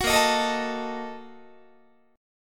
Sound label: A sharp Major 7th Suspended 4th Sharp 5th, Guitar; strummed